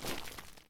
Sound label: walk, grass block; Cubed